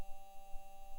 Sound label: mic, mars2020 edlcam